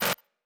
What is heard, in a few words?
Glitch, Electric